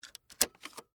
HD Camera Remove, Hd, Camera, Remove, Battery, Sony, Hvr-Z5; Camera Sound Effects; BlastWave